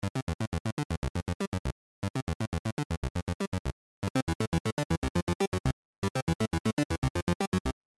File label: evolver subtractor